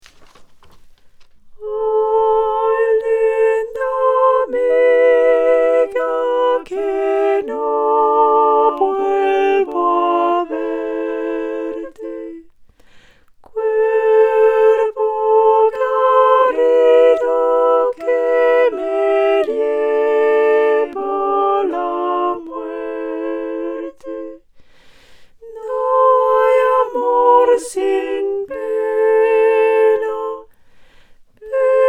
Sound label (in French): Sopranes; Ay Linda Amiga